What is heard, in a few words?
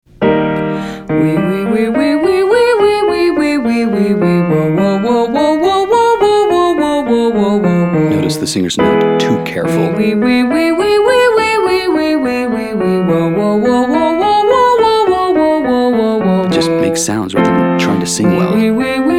Exercise Female example; singing lesson